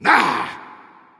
Hood Wolf Attacks

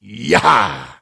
Attack; Voljin

